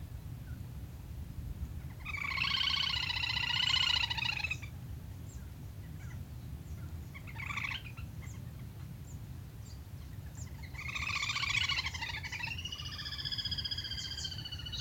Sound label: little grebe tachybaptus ruficollis